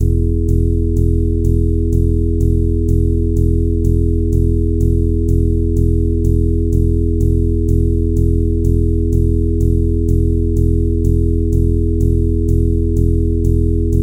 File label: Zen Waves Steady on 1 in E; Mike Cirillo; Musical Self-Actualization